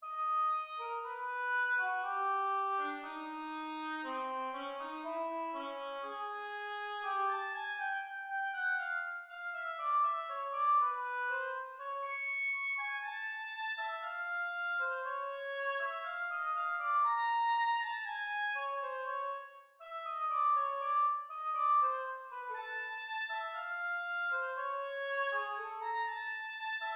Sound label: Oboe Excerpt